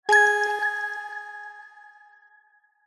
multimedia game sound kids warm mellow positive ping